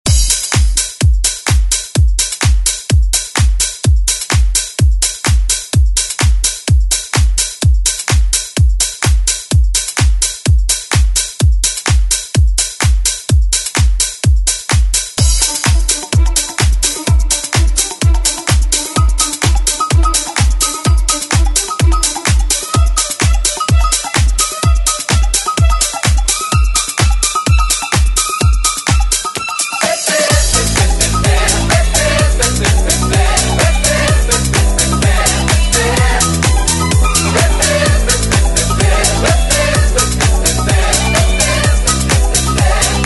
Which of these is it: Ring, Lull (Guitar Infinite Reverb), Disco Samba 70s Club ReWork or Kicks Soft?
Disco Samba 70s Club ReWork